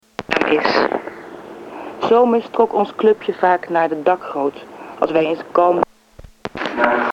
shrine dictorphone mexico, street recording

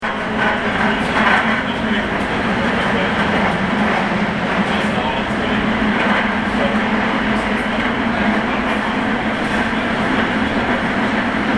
train noise from sink in toilet

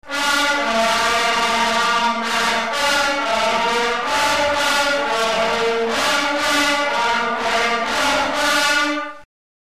fanfare mutant harsh

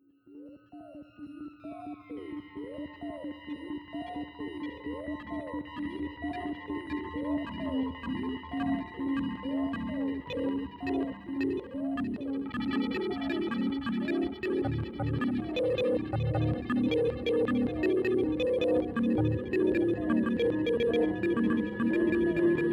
Electro Birds; Migration

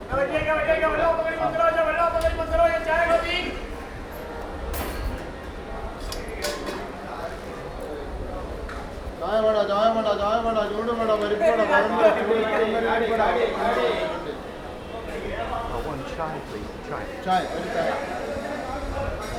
market chants, South India street recordings

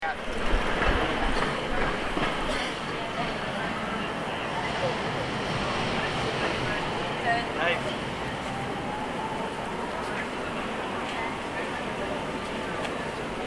cafe ambience

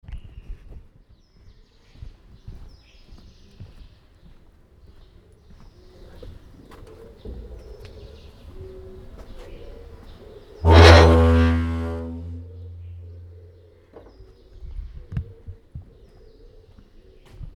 horn, Kathmandu; Kathmandu Ambient sounds

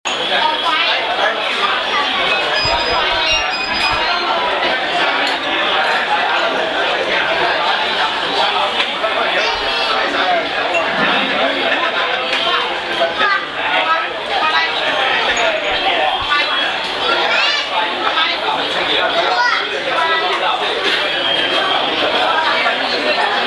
bankok china town, Thailand street recordings; tai bankok china town

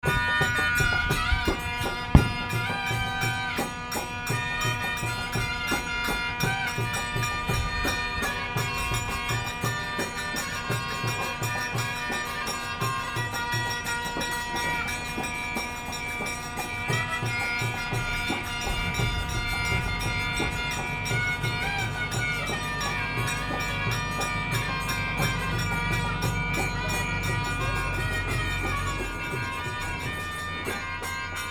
Music indian fiji; 2019

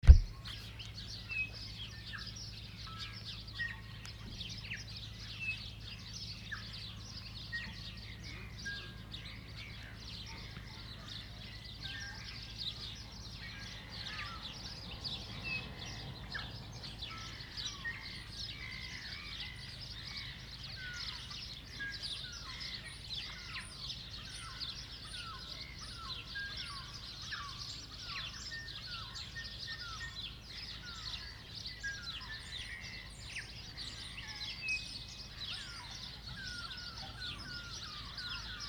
birds on back beach; Port Chalmers

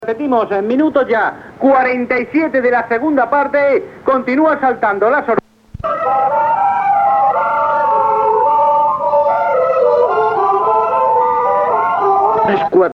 shrine dictorphone mexico, street recording

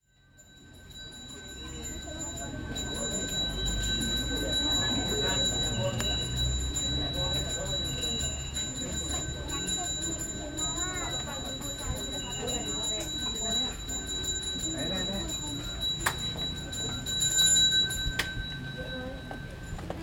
gung, electro gamelan

